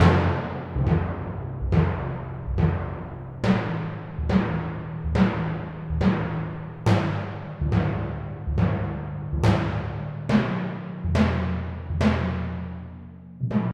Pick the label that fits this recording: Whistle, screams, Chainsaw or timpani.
timpani